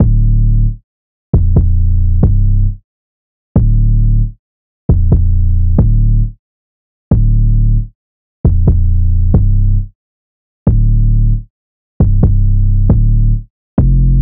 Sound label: 808 loop 135 Dm; Slate Digital BASSHOLE Sample Pack